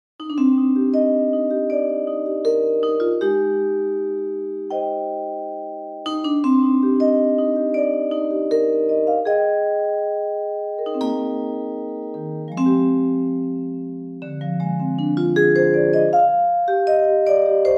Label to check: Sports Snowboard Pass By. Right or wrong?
Wrong